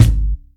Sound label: rich mids steel kick drum sound a sharp key, Royality free kick single shot tuned to the A